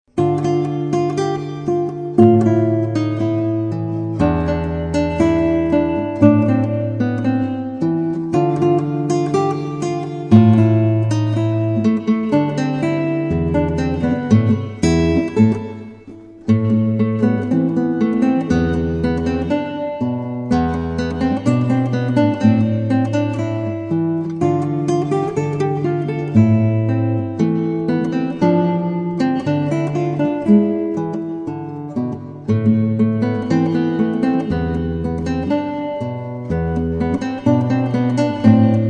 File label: Tango, Gitaarboek